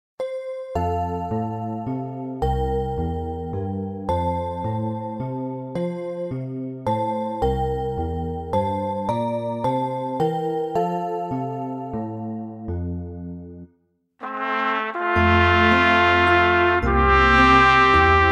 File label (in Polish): 2 trąbki; milosci blask